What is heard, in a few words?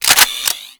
Hand Rocket Launcher Reload, Weapons; Warframe; Corpus